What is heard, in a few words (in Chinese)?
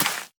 甜浆果丛放置声